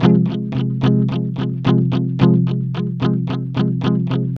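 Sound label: ROCK E minor 110, Vintage Blues Guitar; USB Soundscan vol.22; AKAI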